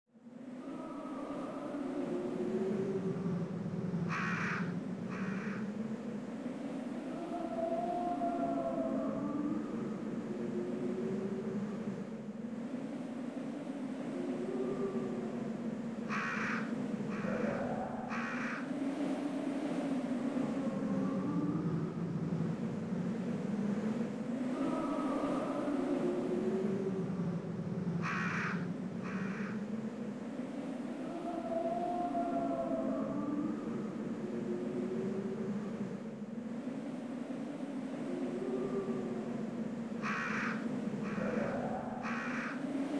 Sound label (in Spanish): viento; hallow